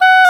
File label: oboe dry; Roland L-CDX-03 Disk 1; Oboe 4-8